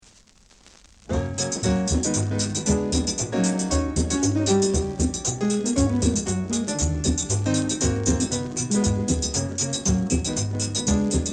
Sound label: melody